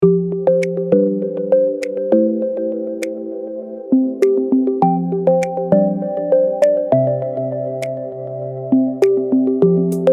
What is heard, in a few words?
bgm